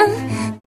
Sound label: Grunt; Lewd SFX